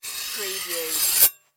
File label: Knife Scrape